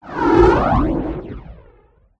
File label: mantikora buff in